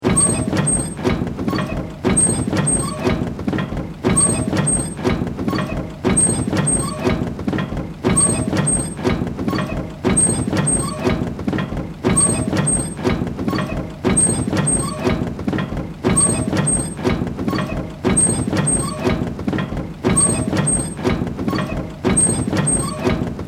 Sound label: Steampunk Machine, Steampunk, Machine, Device, Metal, Squeaks, Loop